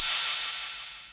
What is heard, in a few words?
Ride, Club Techno Percussion Cymbal; Zero-G